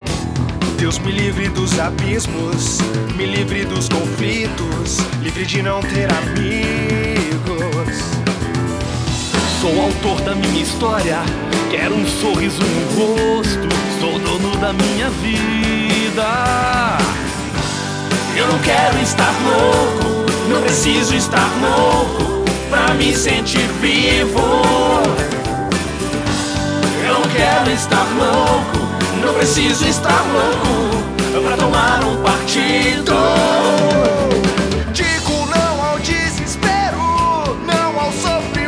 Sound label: JINGLE